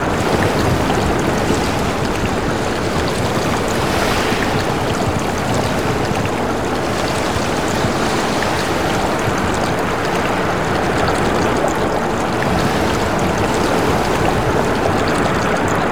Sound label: ambience city